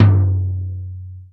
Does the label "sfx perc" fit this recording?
Yes